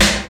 loud snare; Drums In Your FACE!

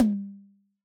Yamaha DJX 2B, High Tom

